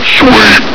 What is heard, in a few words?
sweep!; source engine; hl1; hgrunt; video games